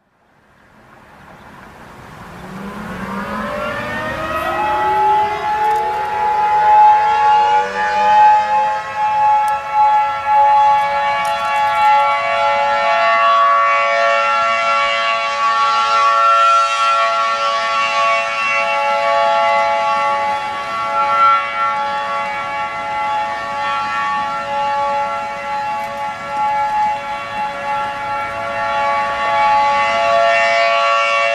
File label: Earthquake Alarm